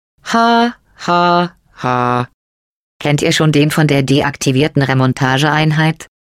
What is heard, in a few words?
taunt laugh; GLaDOS